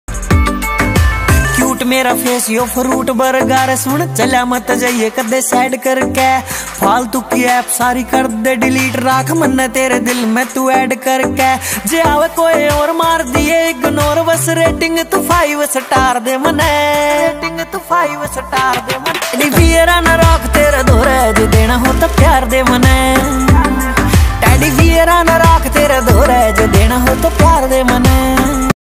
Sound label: haryanvi ringtone